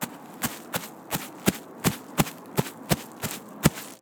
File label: walking grass with nature; soundscrate